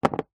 office eraser rubber down on surface; zapsplat

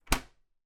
office printer scanner lid close

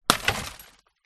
impact glass smash lightbulb in plastic bin

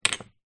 herb spice jar small down next to others on table

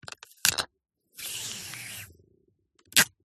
Sellotape Duct Tape Adhesive Reel Roll; PMSFX

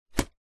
basket picnic hamper lid close; household

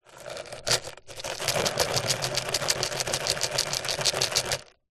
plastic pot containing small debris shake; foley

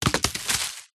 foley bark piece fall off tree trunk hit ground; zapsplat

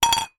foley tin empty metal small down on table; zapsplat

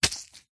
foley wet cloth dab squelch soft single; zapsplat

